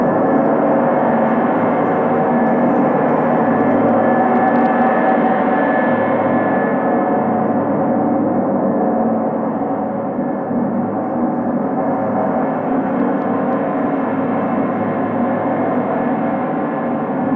gros statio, GONG VIBRATION

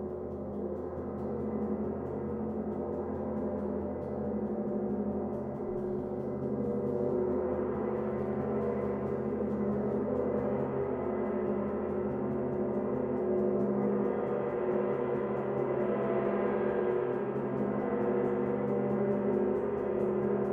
regime permanent, GONG VIBRATION

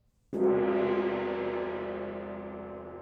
petit, gong vibration

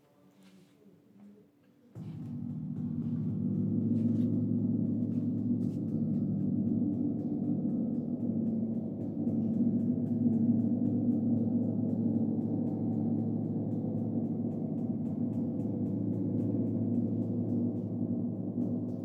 Grandgong, GONG VIBRATION; 1er essaie repeter

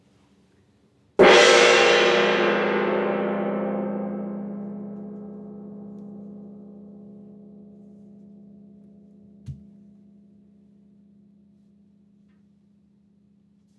petit 1 coup forte, GONG VIBRATION

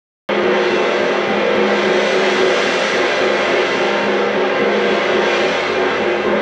petit stat forte, GONG VIBRATION

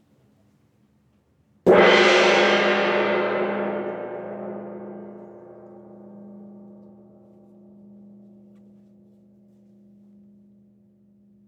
petit 1 coup milieu gauche, GONG VIBRATION

